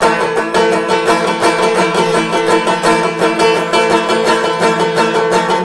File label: banjo; Left 4 Fortress; zombat